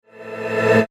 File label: Sci-fi Tension Horror Transition Short; Andrew Fleming